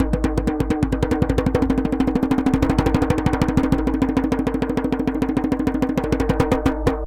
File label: DJEMBE ROLLS; Best Service; World Colours